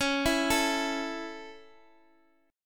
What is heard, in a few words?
C sharp Minor Sharp 5th, chord